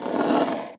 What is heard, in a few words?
Hard Slide Short